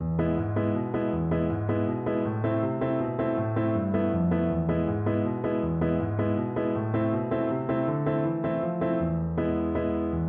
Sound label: malaguena, piano